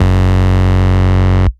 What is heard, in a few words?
Ultimate Bass Root F#; Vengeance Samples